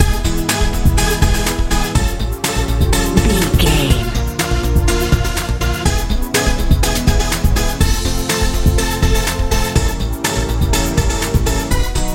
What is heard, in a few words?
modern dance